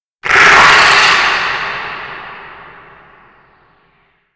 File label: Bahro Shout Reverb; moul-assets